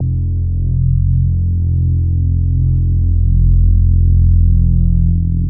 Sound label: Battle Area Drone; ambience gnarled forest